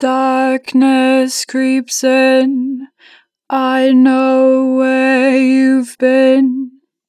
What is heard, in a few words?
Natural Vibrato, Vocals, Darkness creeps in, 4 bar vib; Harmor Vocals